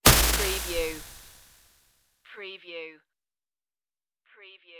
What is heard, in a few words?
futuristic bullet impact